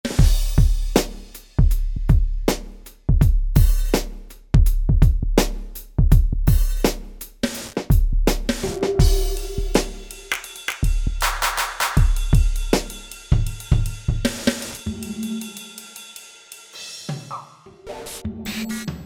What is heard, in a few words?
Way Hip Kit